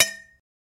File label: Bullet Shell Copper, shell casings fall